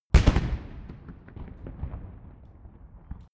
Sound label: rifle salute fire